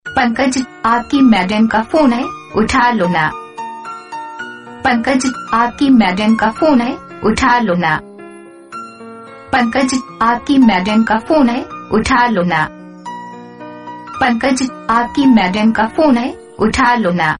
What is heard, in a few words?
background ringtone